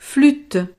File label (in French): flute